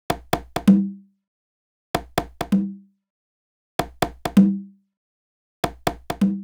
Congas, Big House; Sonic Foundry (Sony Creative Software); Afterhours EDM

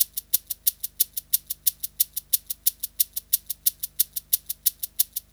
Shaker Loop; Sonic Foundry (Sony Creative Software) - Fluid Dynamics Drum & Bass; Construction Kit; Percussion

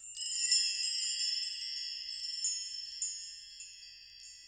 BELLTREE; Greytsounds VOL-1; LATIN PERC

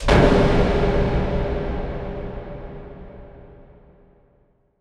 Waterphone Knock; Sonic Foundry (Sony Creative Software); The Electronic Music Manuscript Collection

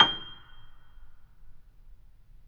Grand Piano Mp G6; AKAI S6000 CD-ROM - Volume 2; Grand Piano 256M

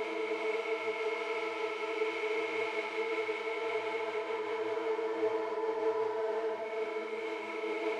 Drone; stereo toolkit samples; Non Tempo Loops

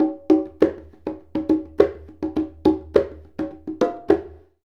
Congas; Essential Percussion

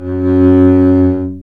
STR ARC BS, Cb Bowed, Cb1 mf vb

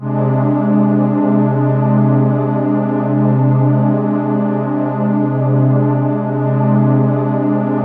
beauty, ethereal atmosphere

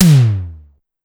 Electro Tom Lo, Pitched Up; Clavia DDrum-AT

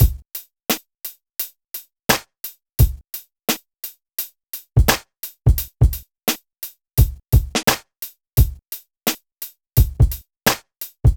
Drums, Hip Hop

